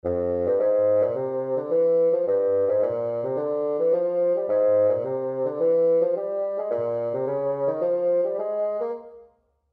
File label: Bass Clef Example